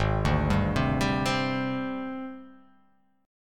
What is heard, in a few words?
G sharp Major 7th Flat 5th